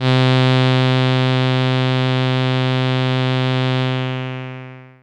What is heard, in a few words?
SYNTH